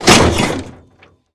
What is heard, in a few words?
snowmobile suspension heavy